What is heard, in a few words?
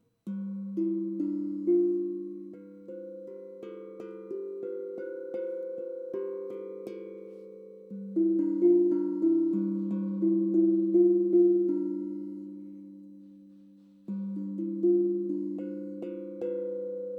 Tong Drum G Harmonic Minor